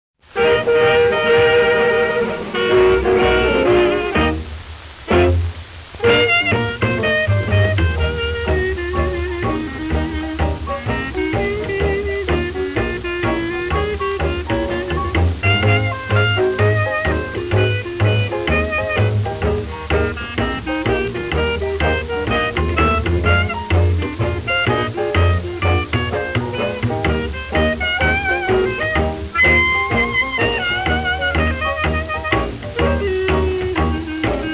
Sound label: blues